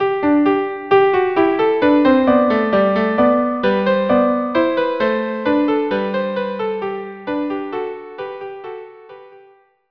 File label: viola duo; Heldenmusik La Vaillance